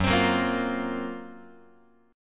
F sharp Major Sharp 11th F sharp, Guitar; F#M#11 strummed; x,2,3,4,2,4; 4A,4E,5A,5D,5G#,6C#; chord shape

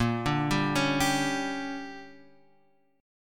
D Major 7th Suspended 4th Sharp 5th

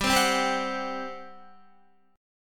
G Major 7th Suspended 4th, G, down, Guitar

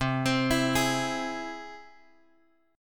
C Augmented, chord; C+ Chord {X 3 X 5 5 4} arpeggiated

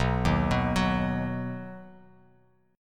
B Major, Bass Standard; arpeggiated; JGuitar

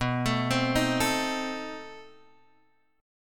B 7th Flat 9th, down, Guitar, Open G

